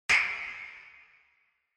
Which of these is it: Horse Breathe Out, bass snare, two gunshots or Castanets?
Castanets